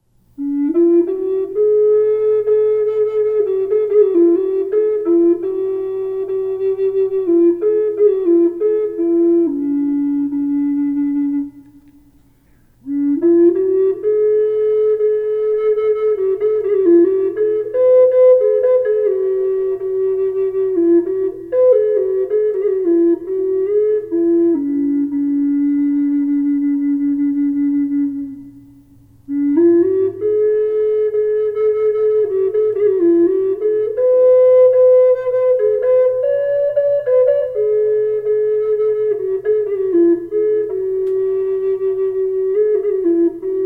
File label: hummingbird reverb low D flute; collector flutes